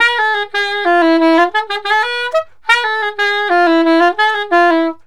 SAX, Balkanish Traditions

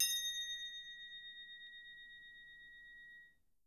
A#3, Percussion & Harp; Miroslav Vitous - Percussion & Harp

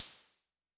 Hi Hats Open; LIMAT Div Dept

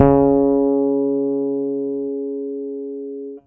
bass C#3; Masterbits CLIMAX 7 - Bass; KEN SMITH BS

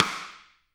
RIM MIX, AMBIENCE RIM

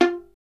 STR VLN, Solo Instruments; Miroslav Vitous

